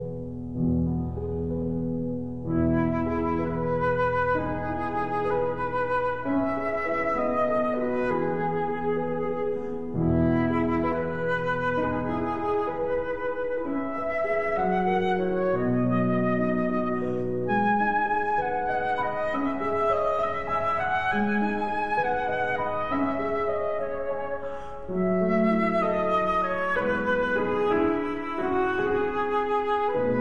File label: Berceuse (Lullaby) for flute and piano